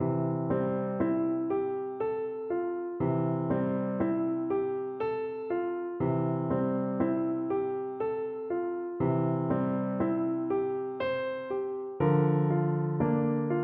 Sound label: Oboe